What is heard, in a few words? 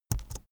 keyboard laptop enter press slow soft